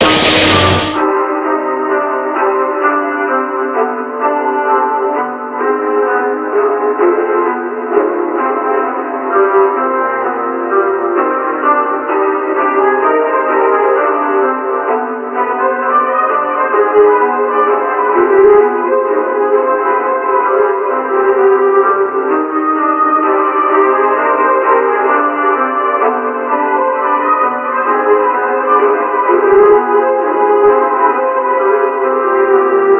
distillery trapped radio, music

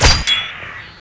catapult throw, machines; Half Life Model Pack; Half Life 2

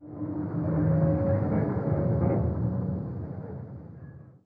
ramproom, ambient; nuke

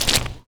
fs fm paint, footsteps; Portal 2

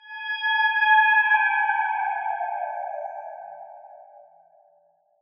ambient abstract metal evo; Half Life Model Pack

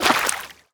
water entry

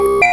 combat scan, scanner; Half Life 2

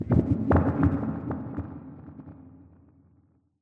amb abstract bubble impact, ambient; Half Life Model Pack

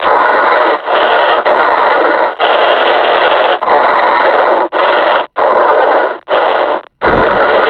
breathing, combine suppressor

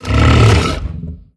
idle in, barnacle; Half Life Alyx

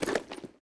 parachute pickup start; Counter Strike Model Pack; CS:GO; survival

